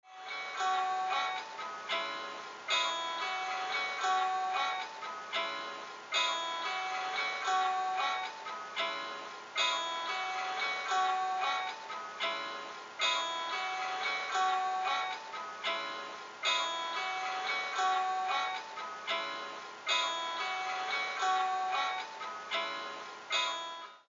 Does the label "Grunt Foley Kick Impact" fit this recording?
No